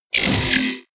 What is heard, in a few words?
sci-fi page back; energie